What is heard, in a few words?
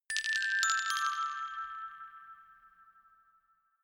notification glockenspiel rattle sequence descend